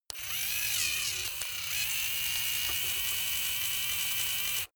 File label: servo move high